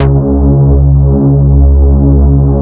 Vengeance Ultimate Bass C#, Root C#

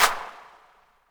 Claps & Snares; Vengeance Samples; VES2

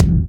Minimal Perc; Minimal Percussion

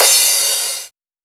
Crash, 140 BPM; Vengeance Samples; Vocal-Hook Kits; Song Kit

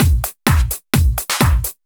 Housebeats 128BPM; Vengeance Samples

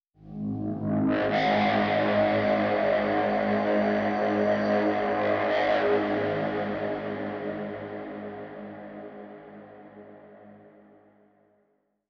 Sound FX 138BPM; G#minor

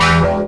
Synths E; vengeance essential club sounds-3

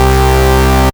Bass C, Offbeat Bass

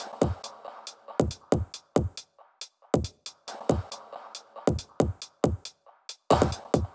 Soothsayer Kit 138 BPM Loop, Drums & Perc; Bminor

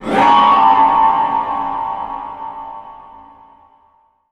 VFX Atmos

